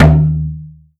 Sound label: SOLID DWONG, PERCUSSIVE